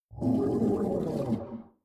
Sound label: Pain Heavy distant; Grounder; Evolve; Kraken